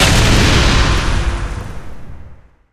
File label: semi distant explosion; Source Engine; Portal 2